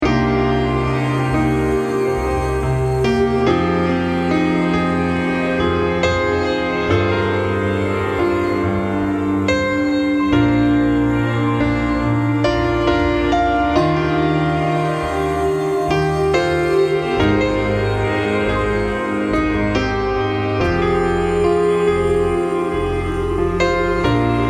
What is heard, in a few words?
Healing Melody